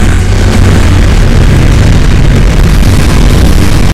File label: Tv Tune Problem